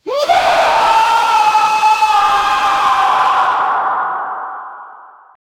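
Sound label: sound deep laughter resonating; break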